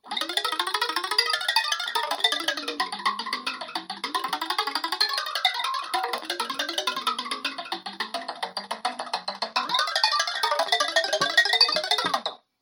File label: percussive, midi